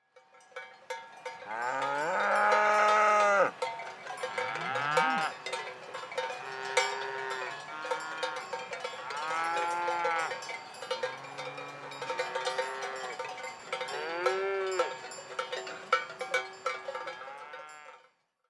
herd of cows

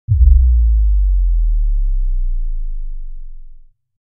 impact

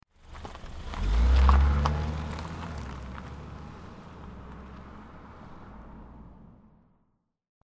Car Driving Away Medium

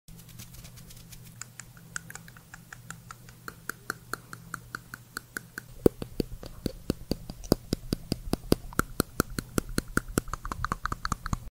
gnash; دندان قروچه

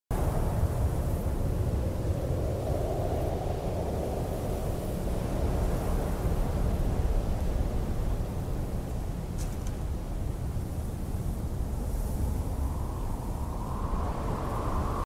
wind in desert; زوزه باد در بیابان

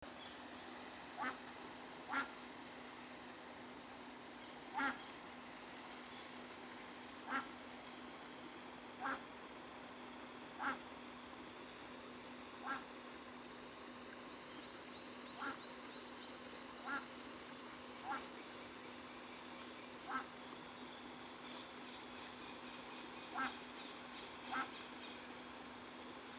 Muscovy duck; اردک مسکویی

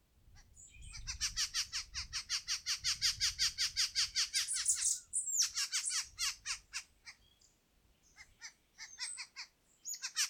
Western red legged thrush

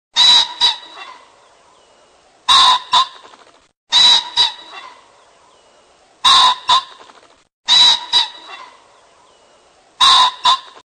Green iguana

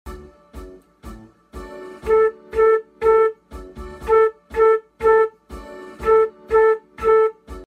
Piccolo

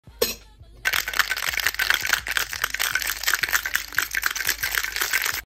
shaker

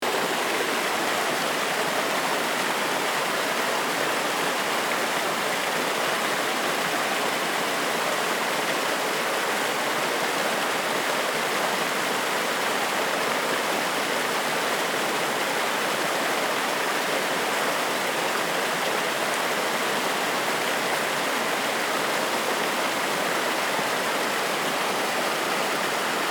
waterfall in the forest

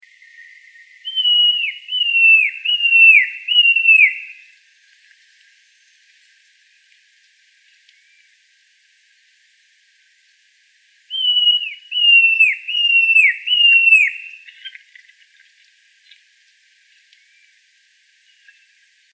Babax lanceolatus; پرنده باباکس چینی

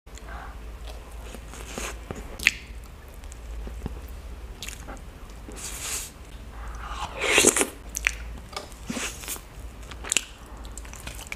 Eating cream filled cake

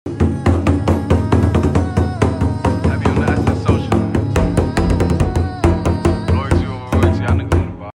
Nagara, ساز ناقارا

